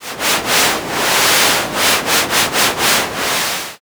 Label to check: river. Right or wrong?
Right